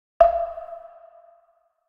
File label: Perc